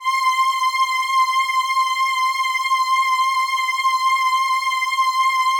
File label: C5, SYNTH WAVES; Music Store Sound Service; Einstein